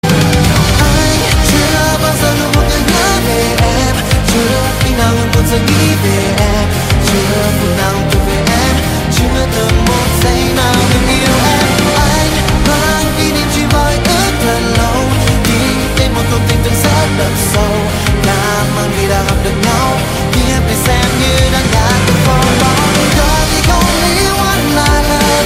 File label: rock orchestra